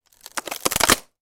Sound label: impact thin wood snapping